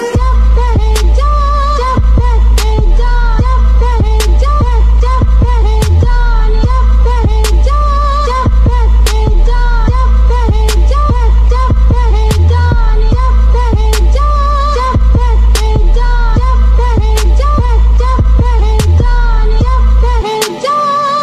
Indian Trap

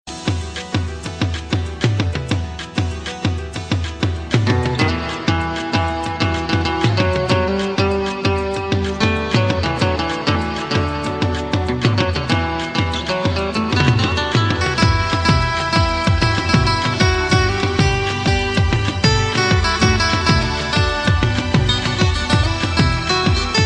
cool guitar mix; ringtones

